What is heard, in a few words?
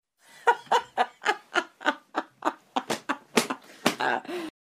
Laugh Female